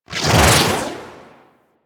Shockwave Spaceman Dash; Warframe; Venus; Venus Shockwave Spaceman Agent

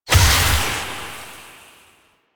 Projectile Death Medium; Weapons

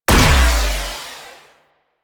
Burst Missile Launcher Fire B; Weapons; Warframe; Railjack

